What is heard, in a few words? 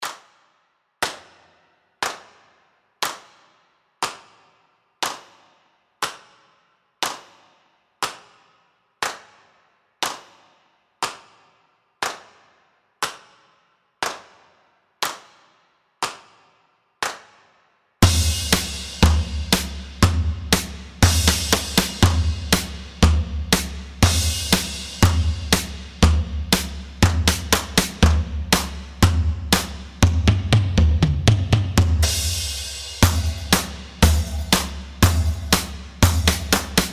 guitar beat 60